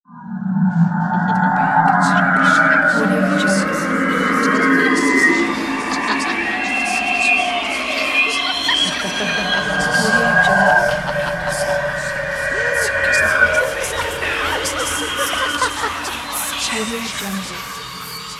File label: ghosts